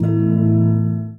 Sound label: Gong Harp; Sonic Foundry (Sony Creative Software) - Friendly Fire; Single Gongs